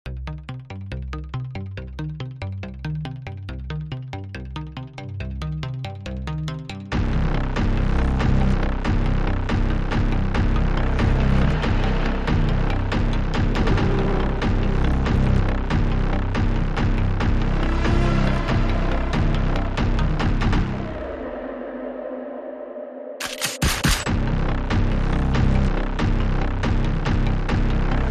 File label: Bass Synths and SFX Only